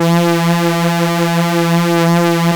Korg PS3100 E 4; Back In Time Records; Korg Universe VOL-1

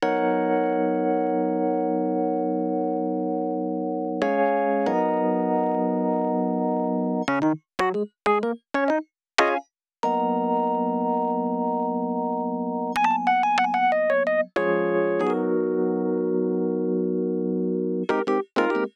rhodes B, Smooth RnB, Ebmaj

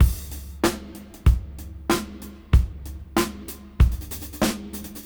Funk 94 BPM; AMG - Keith LeBlanc Phat Butt Jams

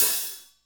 hi-hat; Roland L-CDX-01

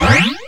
Woopz, hits and bits; Zero-G; Total Drum Bass

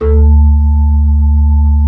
B-3, Blues

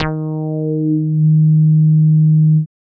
TB 303 BASS

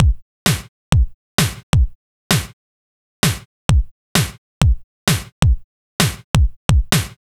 Kick Snare; Sonic Foundry; Sony Creative Software; Afterhours EDM

